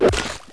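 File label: pick hit body; wanted; weapons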